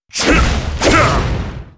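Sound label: monster attack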